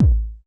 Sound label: kick, Roland MC-909